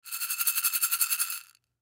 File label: Baby Rattle Shake Shaker Beads; Toy; Miscellaneous Toy